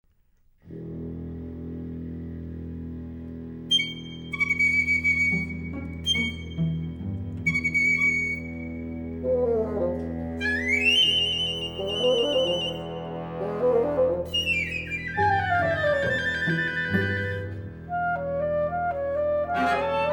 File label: Neo Classical Portrait